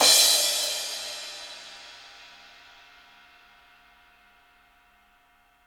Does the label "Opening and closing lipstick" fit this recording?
No